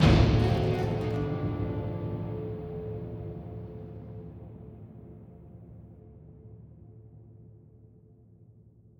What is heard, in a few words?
Palace End Stinger Tension; assassin s creed chronicles india; Sum Palace